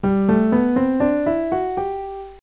ascend sc

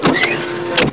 barrel

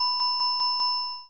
coin

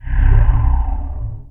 LAND; CD Action; mtg; DuelSounds

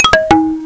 Marimba; bars&pipes sound kit; Instruments

